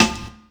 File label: SP1200 snare, E-MU; Hip Hop; E-MU Formula 4000 Series Vol. 1; Hip Hop Nation